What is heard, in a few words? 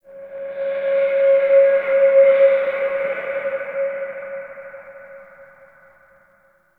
Dark Wind Blow; Tau